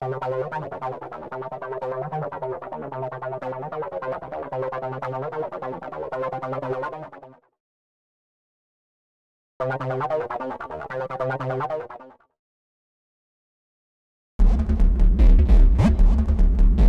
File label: Syn+Bass Loops 150bpm; X-Static Goldmine 5